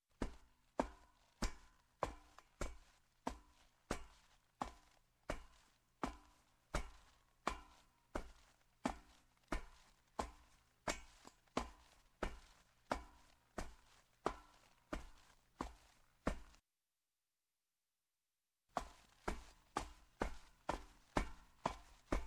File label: Footsteps, Metal Up Metal Steps Sneakers, Slow; Sound Ideas 2000 Series